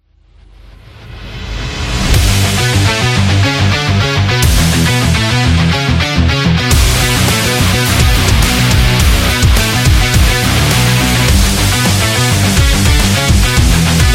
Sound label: Hardcore